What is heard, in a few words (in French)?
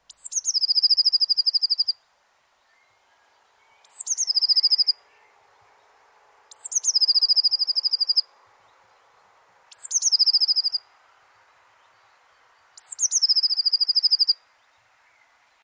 mesange bleue; oiseaux